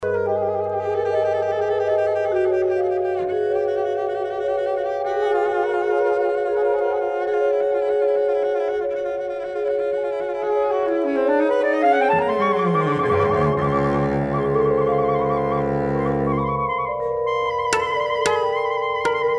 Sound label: escala de blaus saxofonista